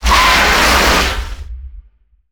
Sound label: skid